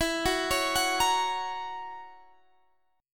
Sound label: F sharp Major E; arpeggiated; F#/E